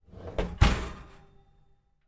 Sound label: household oven door close